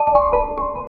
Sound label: modern futuristic elegant; ambient